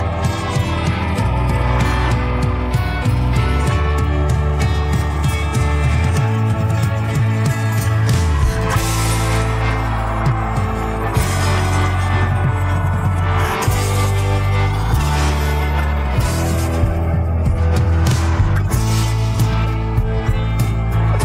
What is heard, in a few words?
solo base